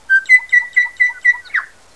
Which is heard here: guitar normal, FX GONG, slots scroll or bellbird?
bellbird